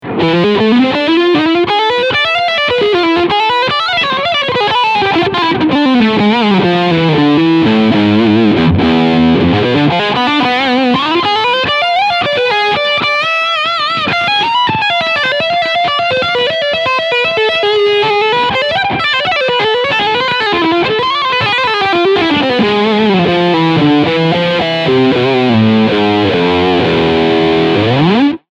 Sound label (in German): Steg Humbucker Overdrive